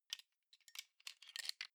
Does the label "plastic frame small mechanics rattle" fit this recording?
Yes